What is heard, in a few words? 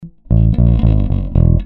bass clip, clipping bass tone; Sansamp DI or an Art MPA Gold as DI